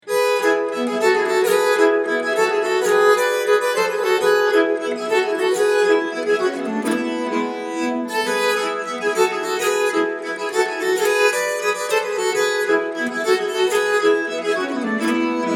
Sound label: balkan polska, nyckelharpa